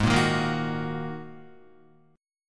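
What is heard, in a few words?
A flat 7th Suspended 2nd A flat Guitar, chord; Ab7sus2 strummed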